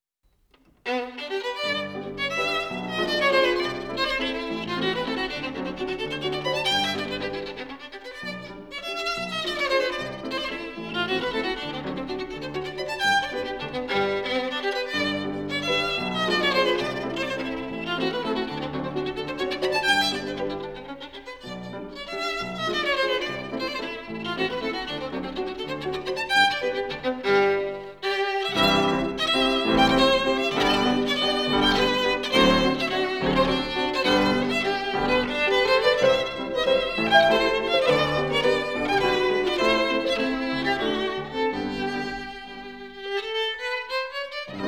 for violin & piano

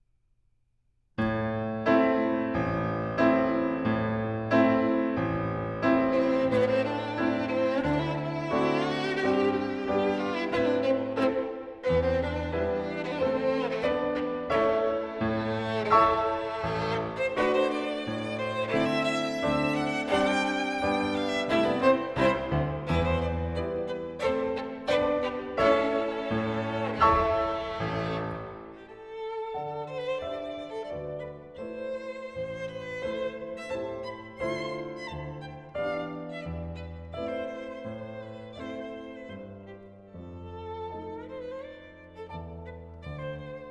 Romanian Folk Dances, Allegro moderato; I． Dance with Sticks

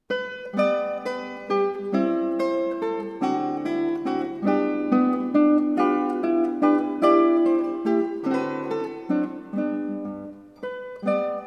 Preludio in G Major; Recuerdos de la Alhambra

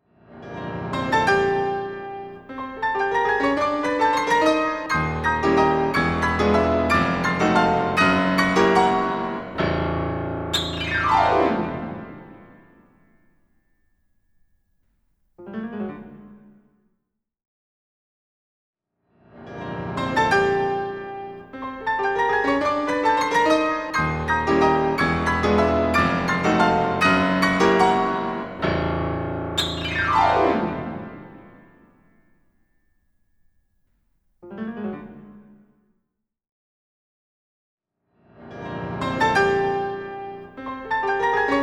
Music II (Stereo); Denon Audio Technical CD

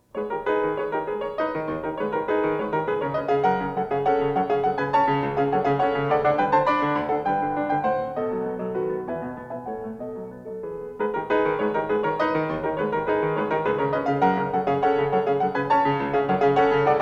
Waltzes for piano, 4 hands (or piano), in A Minor; Brahms

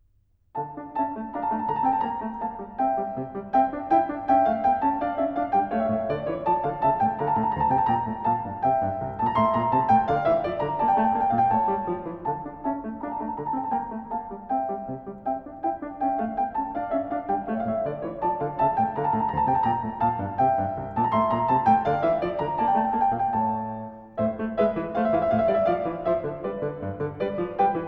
English Suite in D Minor, Gavotte; English Suite No. 6; BWV 811; Bach

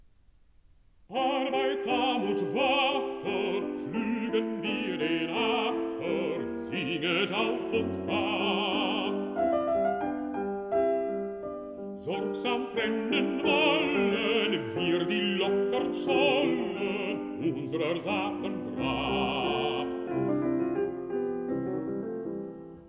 song for voice & piano

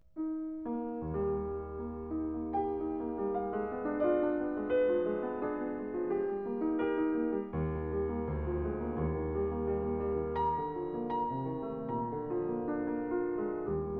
Variations and fugue for piano in E flat major

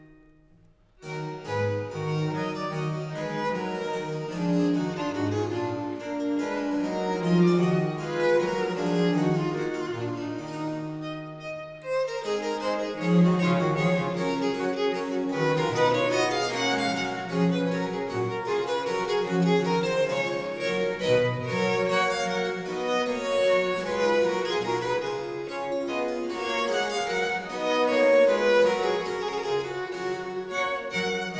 Sonata in E minor, Rondeau, Trio Sonatas; Handel

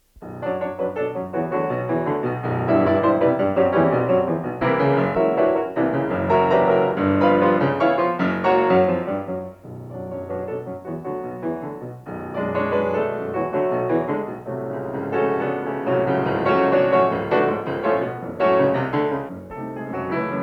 Preludes for piano, in B Minor; Scriabin

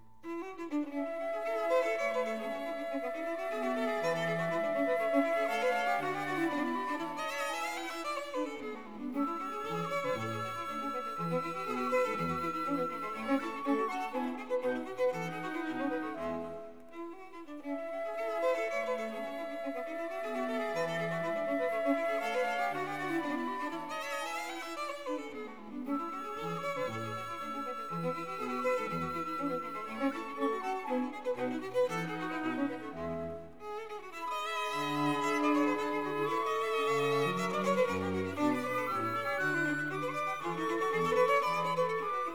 flute, violin, viola & cello; A major; Andantino; Quartet; K. 298; Mozart; The Flute Quartets

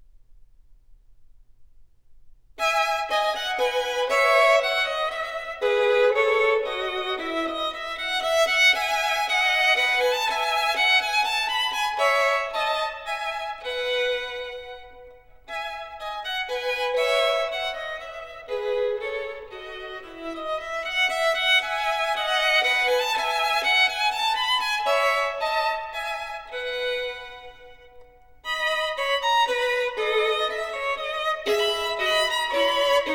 solo violin, E major; Partita for solo violin No． 3; BWV 1006; Menuet I

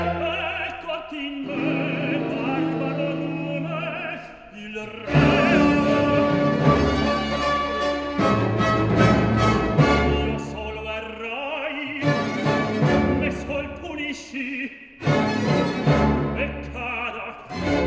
opera, Recitativo

